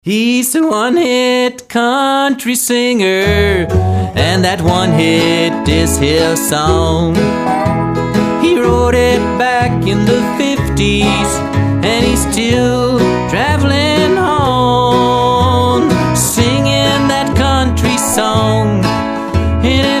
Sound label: One Hit Country Singer